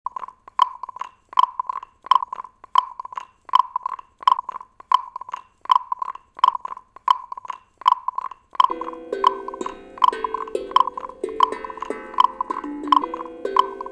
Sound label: Frog Beats